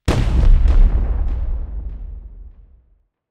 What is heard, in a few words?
fireworks; spacemap